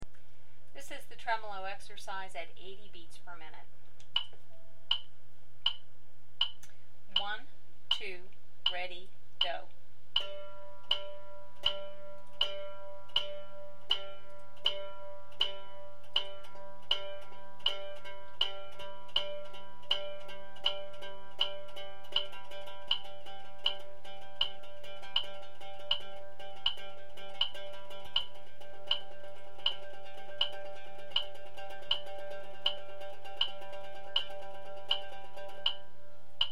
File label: Tremolo Exercise 80bpm, mandolin